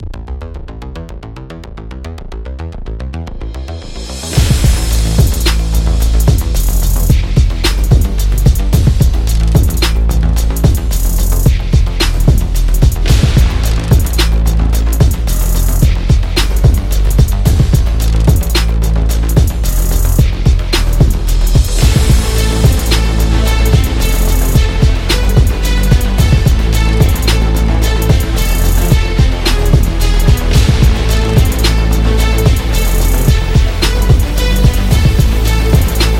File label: Epic Beat; corporate background